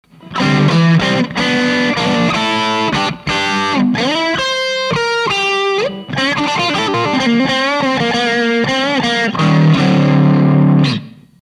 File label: 1458, BOOST, LM1458N